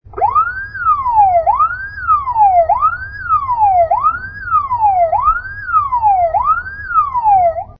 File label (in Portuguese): WAIL LENTO, sirene; REBT 27C20